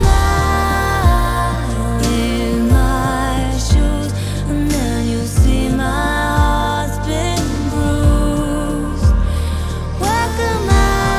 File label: Vocalist